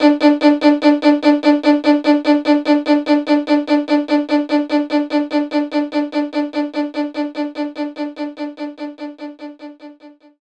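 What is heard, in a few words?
Violin Strike D4; knights try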